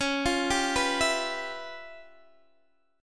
C sharp 7th Sharp 9th Flat 5th Guitar Open D, chord